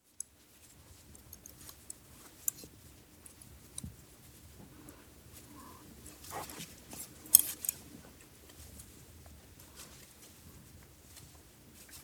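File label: Knitting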